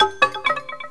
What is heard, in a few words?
incoming